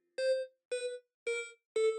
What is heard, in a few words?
loosing Sound